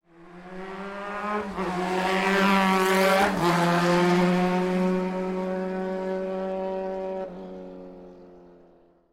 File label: bike pass